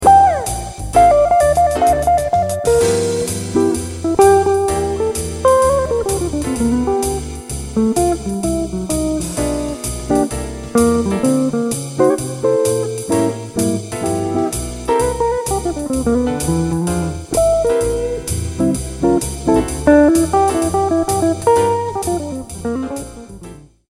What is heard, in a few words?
dim blues chords